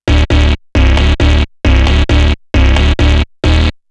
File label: Bass Pulse; pitchshifter PSI